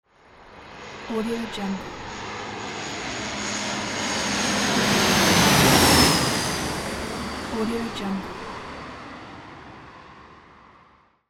plane

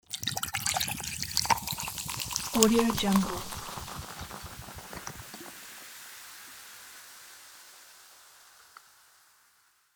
pouring soda; javierpaniagua

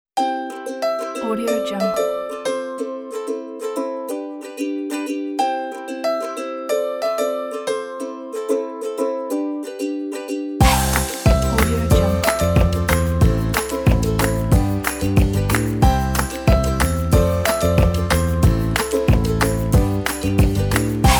happy upbeat folk

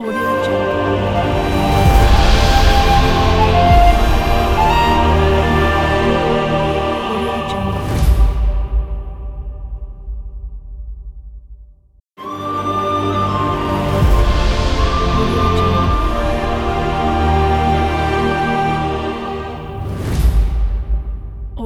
cinematic adventure opener ident

